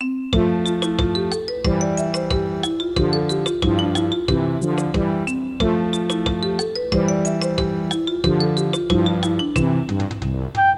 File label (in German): kinderlieder playback